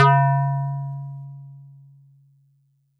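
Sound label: Metal Tube DX100 C#1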